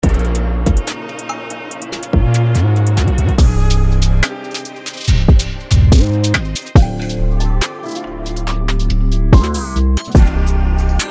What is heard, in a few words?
Drill; Drillitary Bundle